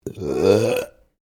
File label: Burp female medium, Human Burping